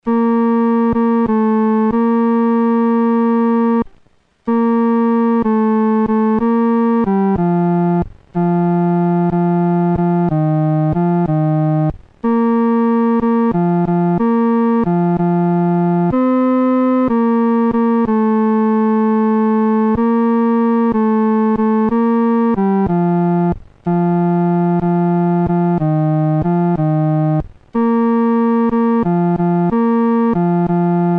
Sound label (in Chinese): 独奏 第三声; 主爱救我 Love Lifted Me